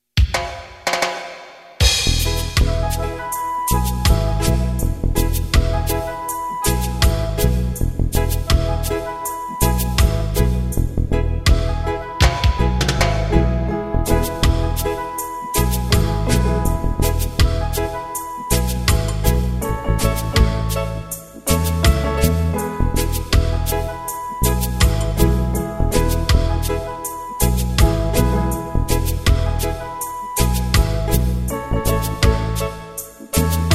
Instrumental, reggae band

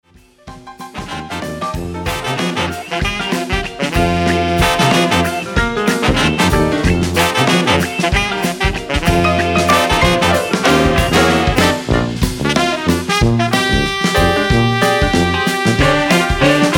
groove, New Orleans jazz; Live Band

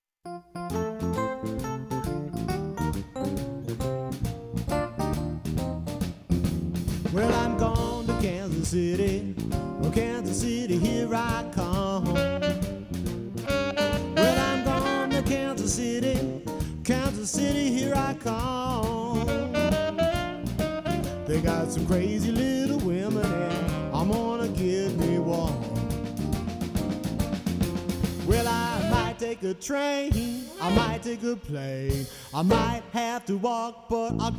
swing, Dixieland jazz; Live Band; Kansas City; Hotlanta Dixieland Jazz

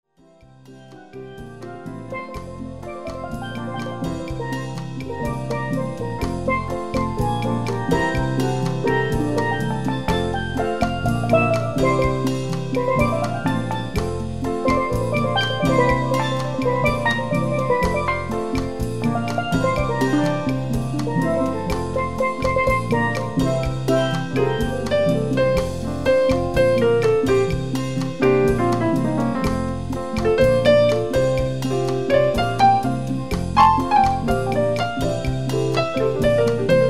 Steel Drum; Steel Drum Band

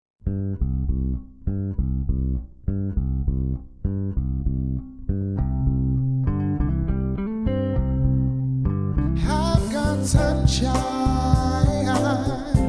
dance band

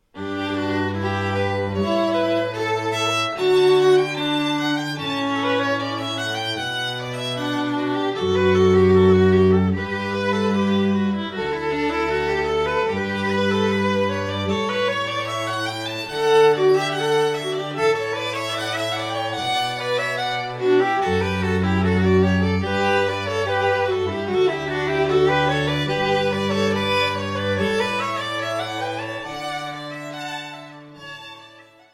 Trio, String Ensembles; Just Divine

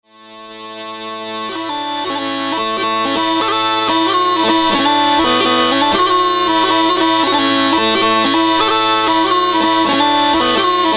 Wedding, bagpipes

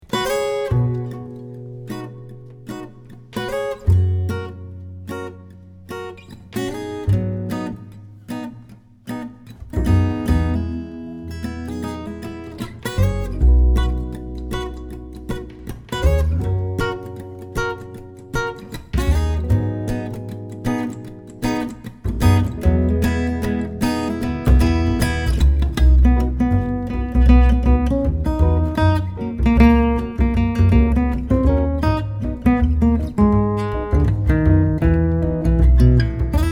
acoustic duo instrumental; Swing

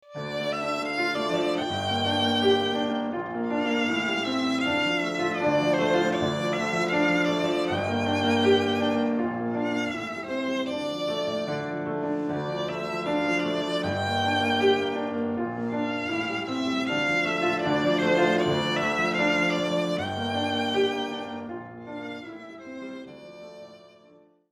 Jewish Traditional; Classical; Chamber Music

